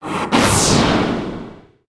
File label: arma attack, monster